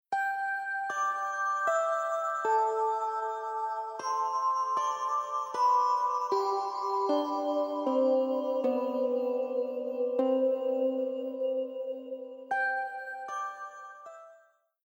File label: FutureBass Mix Melo Celesta All FX On